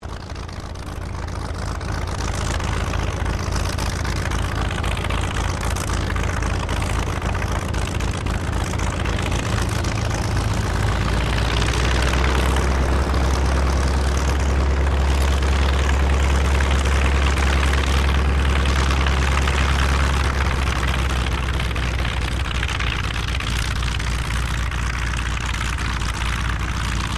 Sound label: 1945 HAWKER SEAFURY IDLE, Hawker Seafury fighter-bomber; Airplanes; Vehicles; Aircraft Collection; Twistedtracks